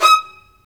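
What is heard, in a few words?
Marcato, Strings; Vlas Marcato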